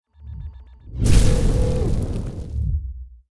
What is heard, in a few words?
Incineration; starbites